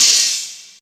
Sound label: hh heavy, Yamaha TG-500